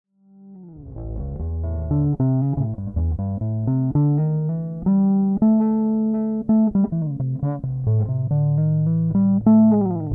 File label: 1971 SB-400 neck, gibson bass